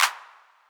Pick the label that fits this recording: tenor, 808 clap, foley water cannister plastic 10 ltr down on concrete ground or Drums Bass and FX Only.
808 clap